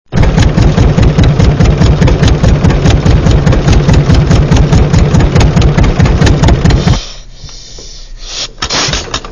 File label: steamboat